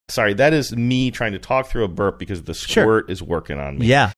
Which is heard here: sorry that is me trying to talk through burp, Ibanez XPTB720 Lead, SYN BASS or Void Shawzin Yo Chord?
sorry that is me trying to talk through burp